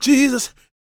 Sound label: gospel male, vocal; ILIO; Vocal Planet